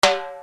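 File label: RX17 timbale high, Yamaha RX-17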